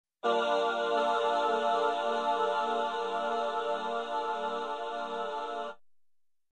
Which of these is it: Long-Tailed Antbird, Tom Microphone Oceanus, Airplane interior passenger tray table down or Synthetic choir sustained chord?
Synthetic choir sustained chord